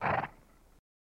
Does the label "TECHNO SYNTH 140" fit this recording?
No